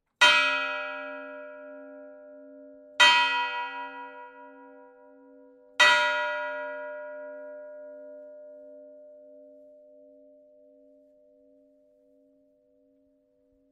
3 slag kirkeklokker; gjerdrum

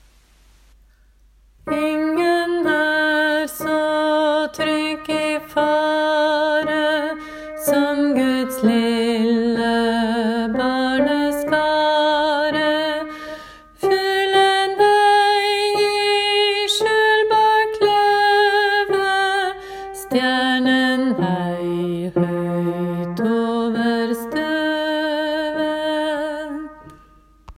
bass; ingen-er-sa-trygg 1.del; åfjord

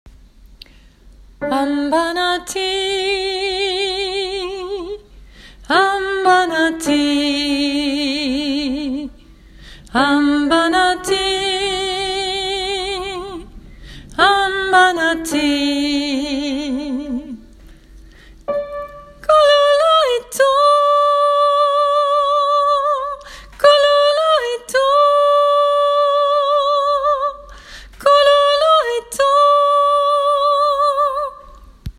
sopran; åfjord

